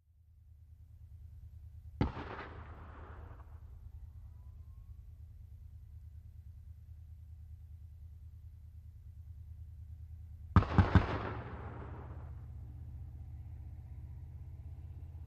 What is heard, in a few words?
.50 Caliber: Bursts, Distant.